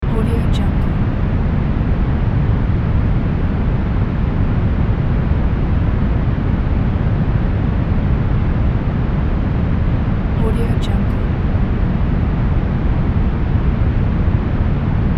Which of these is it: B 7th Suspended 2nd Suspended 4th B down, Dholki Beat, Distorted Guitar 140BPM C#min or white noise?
white noise